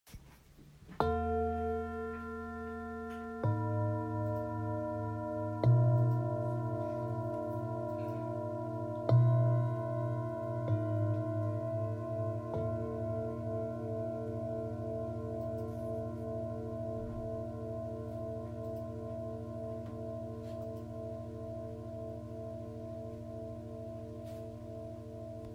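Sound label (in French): Set 3 bols, bols chantants tibétains; etoile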